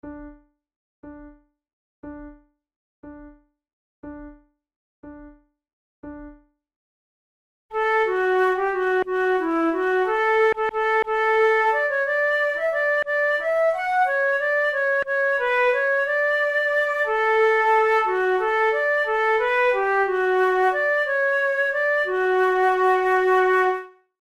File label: duet, flute